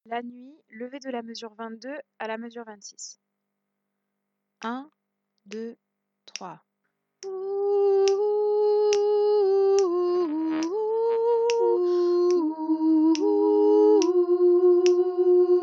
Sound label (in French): basse